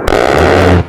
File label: GAU-8; mcheli